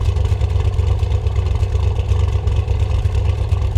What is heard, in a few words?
65 Corvette Idle Loop, Automobiles